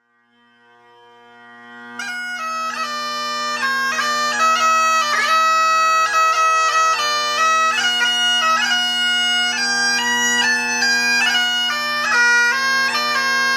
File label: Victory March, bagpipes